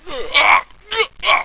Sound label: oooww; quake